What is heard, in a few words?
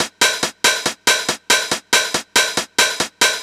NRG Cymbal; Sonic Foundry (Sony Creative Software); Trance NRG VOL-1